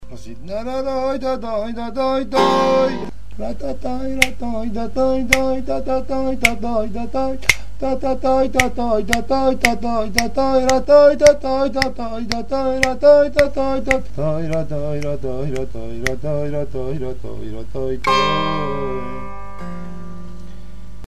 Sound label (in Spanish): canto; Musica persa; Baiate-Tork; bestenighar